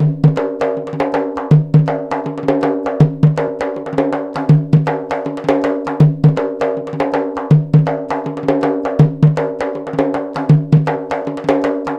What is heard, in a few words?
PERC LP, Arabian Traditions